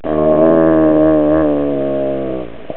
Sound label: moan